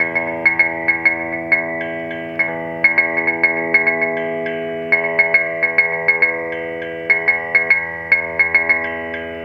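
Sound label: HILOOP, guitar LOOPS; USB Soundscan vol.31; Miro s Grooves; AKAI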